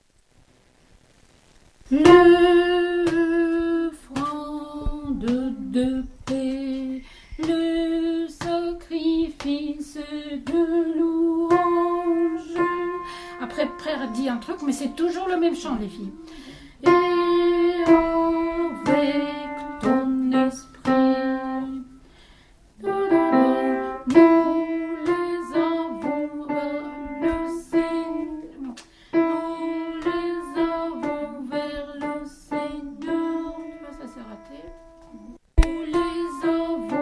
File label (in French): Canon eucharistique femmes